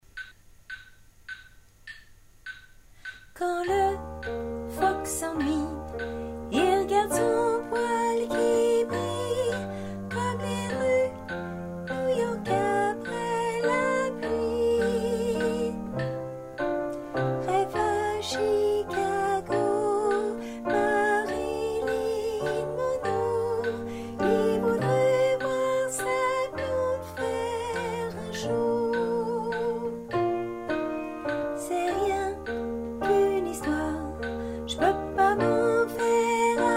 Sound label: soprano